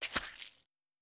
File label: footsteps; grunk